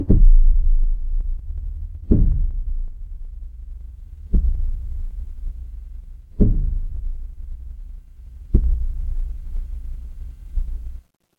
ambience room tone

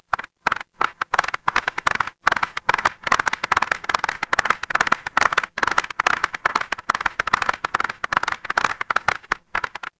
crowd applause

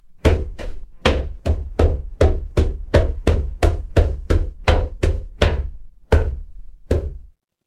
footsteps stairs

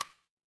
ui click

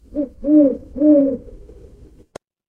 animal owl

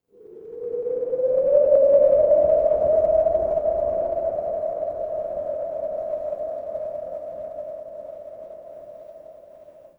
ambience wind

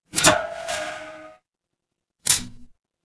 mechanical lever

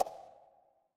ui pop

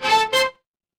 feedback level up

